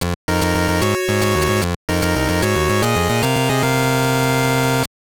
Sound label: Jingle; Fleet Commander VS.; GB